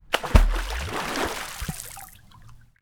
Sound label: Water; TOOLKIT vol.2